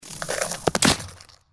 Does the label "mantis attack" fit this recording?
Yes